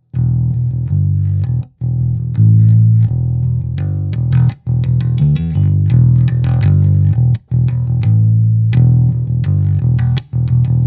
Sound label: Crush Low Notes